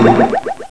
conical, electronic sounds; Sound Effects for Multimedia - Volume 1

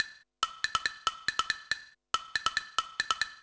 drums; Pong; music; ice

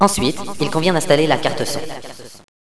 kit; Villagina; ecole

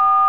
TONE

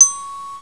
fly tick; ENOMERCY; noyz

